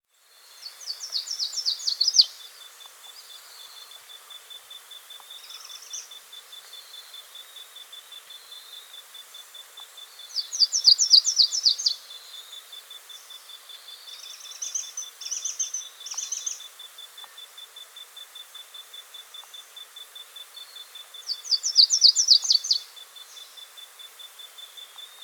Slate-Throated Redstart (Myioborus Miniatus), Andean Birds; S. America